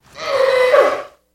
Camel Trump Call, camels

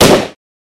Gun AR-15 Single Shot Fire

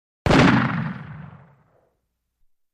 Black Powder Musket; musket fire

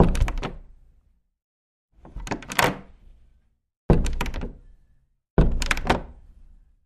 Door Wood Open, Close x4

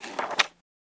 Book Page Turn

